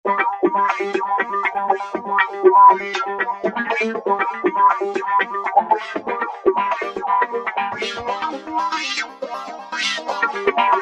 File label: Roland V-Synth Triple Wha; Factory Patches